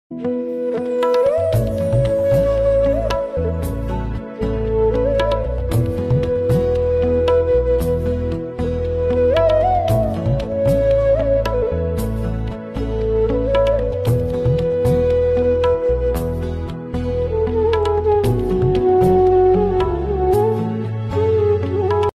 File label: unwind instrumental